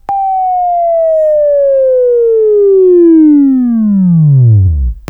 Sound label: Buzz; Labview-Program; Sweeping Training program